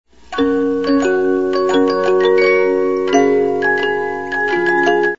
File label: Allegro, 72-Note Movements, music box; Eine Kleine Nachtmusik